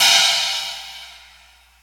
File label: china crash, cymbals; Trance Explosion Vol1; Drums